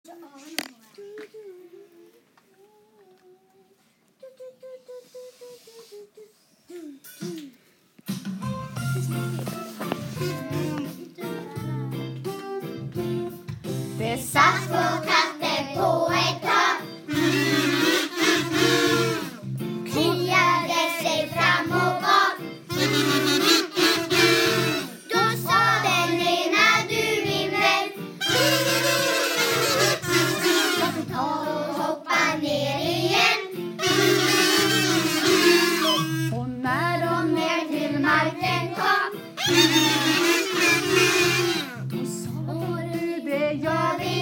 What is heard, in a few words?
Default kazoo